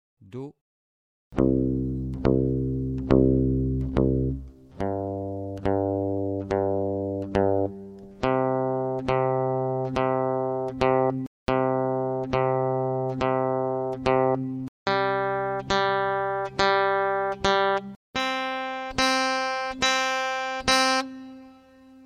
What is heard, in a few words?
C open